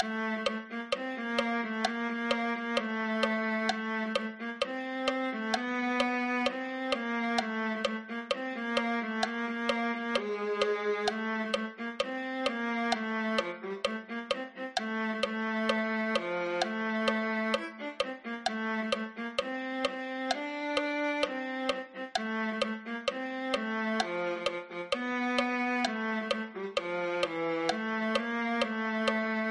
viola

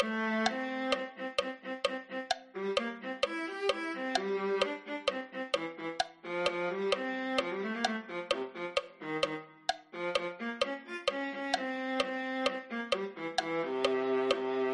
viola

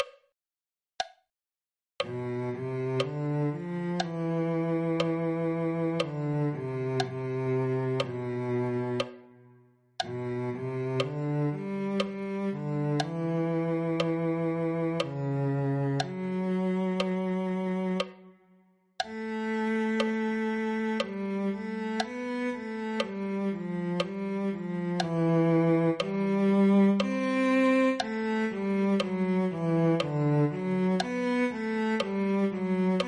cello

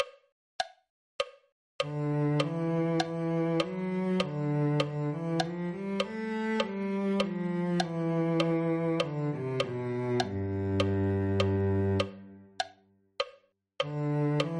cello

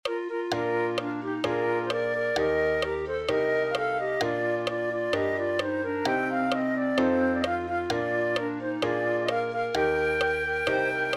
flute

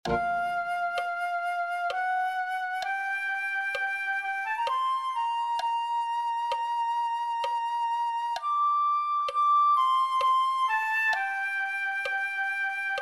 flute

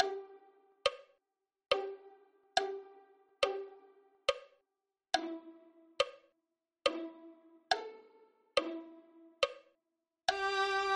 violin 2

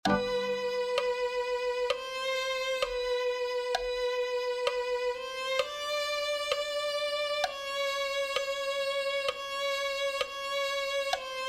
violin

